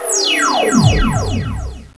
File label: AntiGravity; Monkey Shines 1.2.1